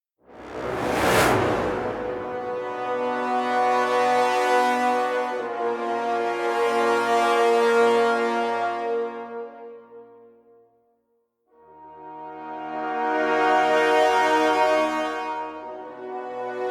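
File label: Heavy Blades Brass; Grineer; Grineer One B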